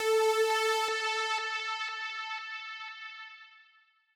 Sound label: Synth A; Zero-G Sound Sense Grime Scene; GS Construction Kits; Just Flex